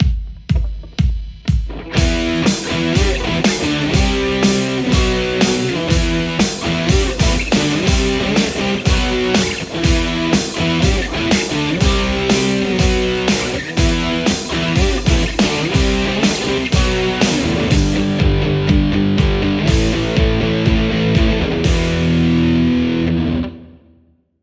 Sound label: Les Paul; tse x30 v1 6